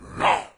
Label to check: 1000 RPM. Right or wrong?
Wrong